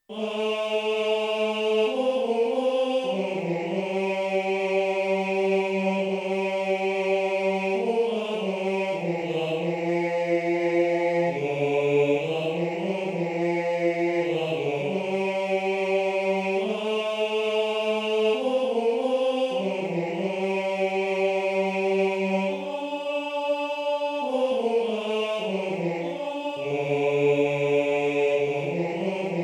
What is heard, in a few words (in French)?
soprano; Chants